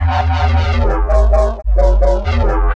Bass loops; neuro; Optiv & BTK

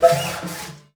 CELLO FINGER; 90 s Sample CDs; Xsample VOL-5

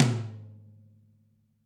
GRETSCH TOM; ILIO; Double Platinum Drums 1

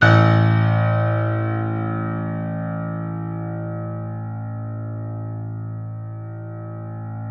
A, 3-D Audio Collection, 3D Pianos, Yamaha C7; E-MU Producer Series Vol. 5

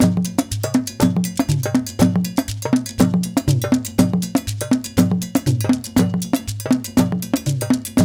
JU JU, African Contemporary

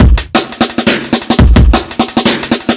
E Beat, Electronic Beats; KJ Sawka; Drum n Bass & Beyond

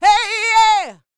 D YEAH, Vocal R&B Dance; DB DANCE

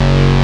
JUP8 PULSE; E-MU Formula 4000 Series Vol. 2

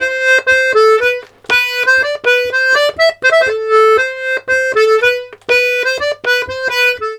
Accordions, 120 laride

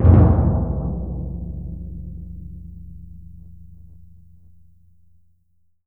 TMP C#, Synclavier Percussion; ILIO; Synclavier Percussion 2; World & Orchestral

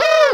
Unknown Jingle; SNES; Battle Soccer 2

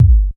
BBASE, DRUMS PERC; Q Up Arts; Dream Experience

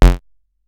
bass stabs, Drum Machines FutureRetro FR777

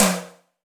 Rock Tom, Roland BK-5

